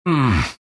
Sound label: riki pain